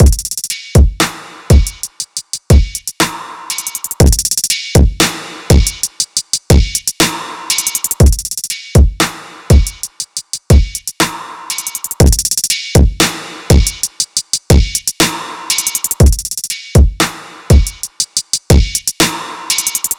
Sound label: allcomp on drums